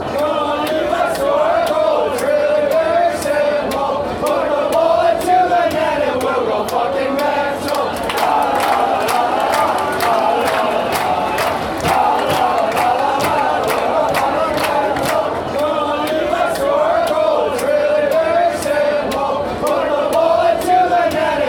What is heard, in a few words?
come on us score a goal; Uncle Sam's Army